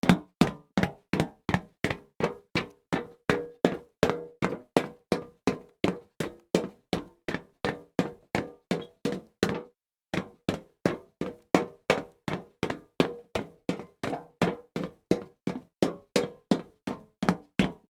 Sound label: walk fast on hollow wood series, foley